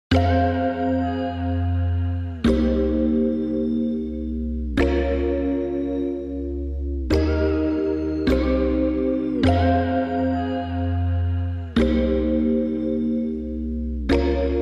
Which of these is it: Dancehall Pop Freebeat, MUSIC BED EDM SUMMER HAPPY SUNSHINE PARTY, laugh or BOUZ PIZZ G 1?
Dancehall Pop Freebeat